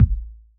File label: hip hop kit kick